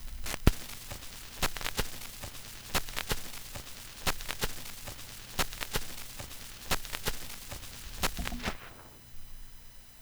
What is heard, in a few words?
vinyl crackle; 99Sounds; Vinyl Noise SFX